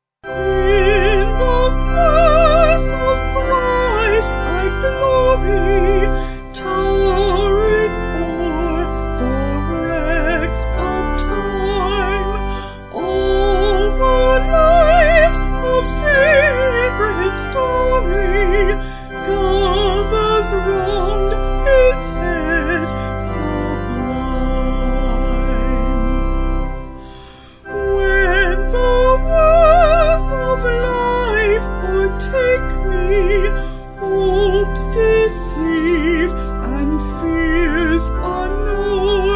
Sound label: In The Cross Rathbun Vocal Organ